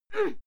female fight moan short

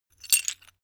metal pieces clatter short

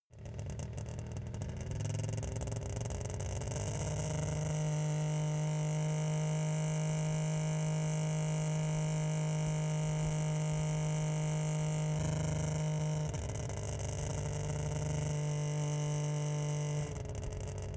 car saab 92 drive slow exhaust

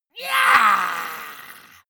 monster growl high; schreie

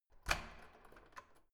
door key unlock

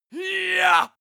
male attack shout long

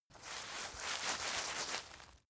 rustle foliage short; berufe; foerster

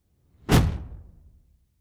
sci-fi shot soft

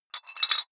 dumbbell take off rattling weight, fitnessstudio

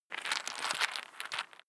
nut handling; haus garten; kueche

